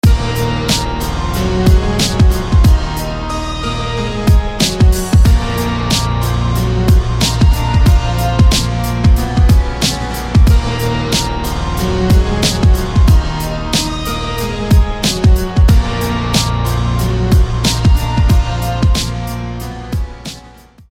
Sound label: Enhanced Piano